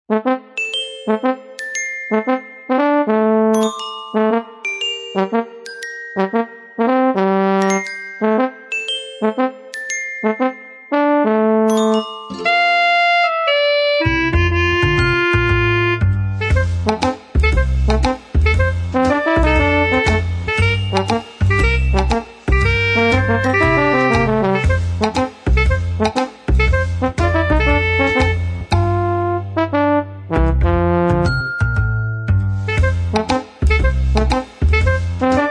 theme

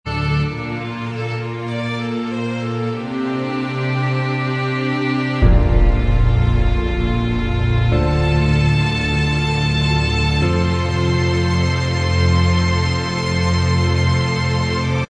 Inst

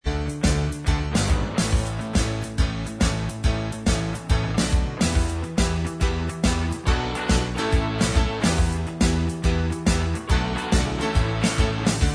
Inst Track

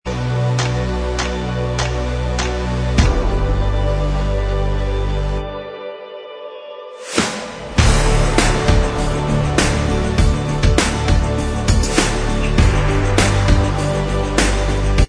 Inst

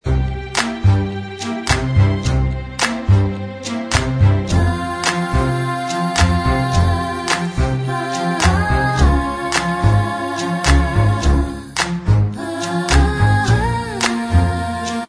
Bvox

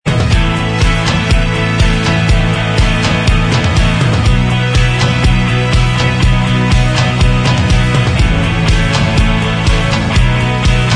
Inst Track, backing tracks

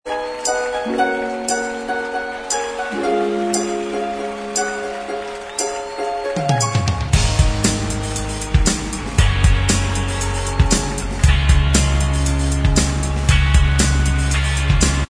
Inst Track

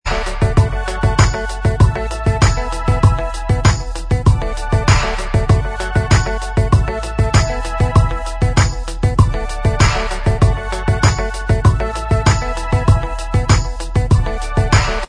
Inst Track

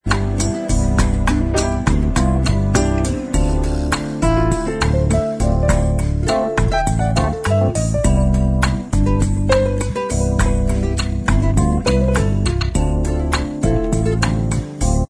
bossa mix Inst

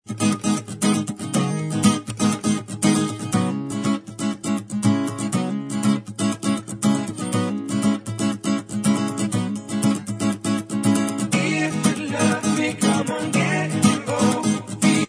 Bvox

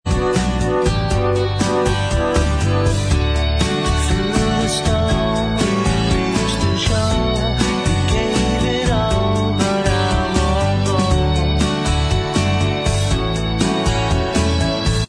in style of backing vox

